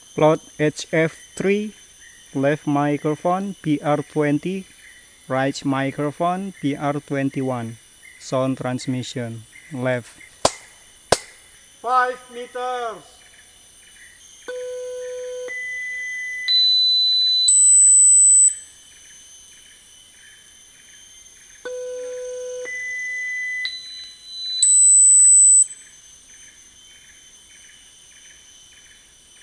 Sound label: birds; SoundEFForTS Landscape assessment